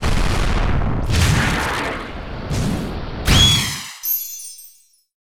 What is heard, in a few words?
se yes; monster hunter 4 ultimate